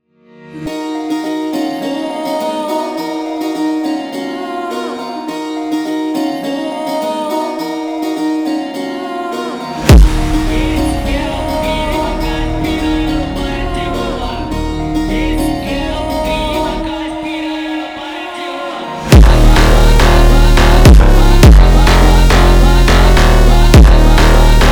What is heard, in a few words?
Funk